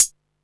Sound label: hat, Techno 138 BPM